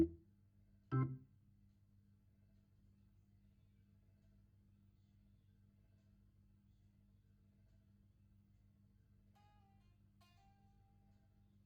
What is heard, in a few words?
Hammond Leslie